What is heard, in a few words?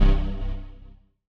critical stop